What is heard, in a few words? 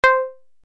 Pizzi